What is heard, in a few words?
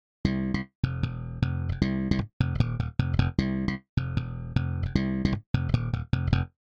bass off